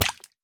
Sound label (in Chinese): 鱼受伤声